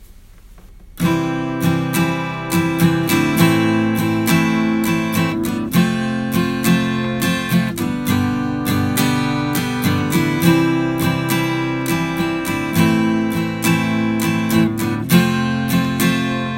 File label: C Am F G